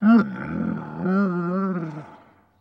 Camel Vocal Moan; camels

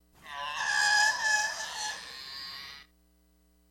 Camel Hi Pitch Narrow, camels

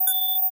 Computer Button Key Swi; communications

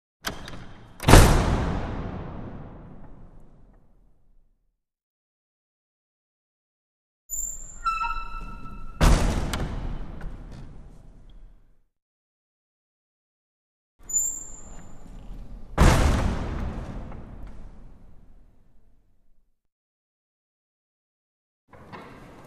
Large Wood Glass Door, crash slams

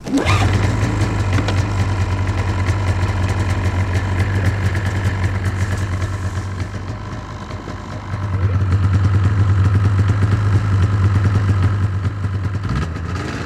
Snowmobile Strt Run

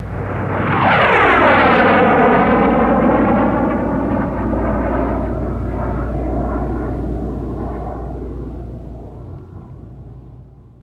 F86 Pass By, f-86 sabre

